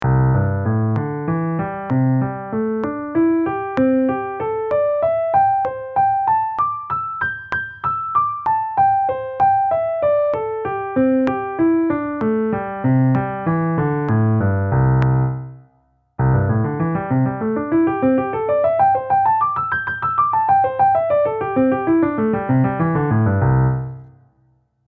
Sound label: Slow n Fast